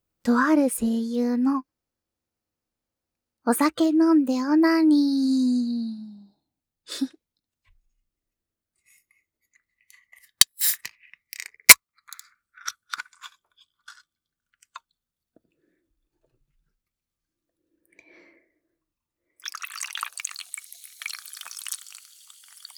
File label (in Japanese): ほろ酔いオナニー 実演, 飲酒自慰